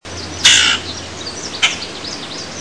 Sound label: Common Grackle